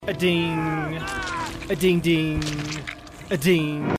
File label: ding ding ding